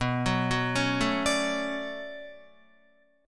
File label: B-Minor 9th B down Guitar; Bm9; arpeggiated; JGuitar